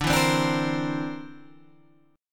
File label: D 7th Suspended 2nd Sharp 5th D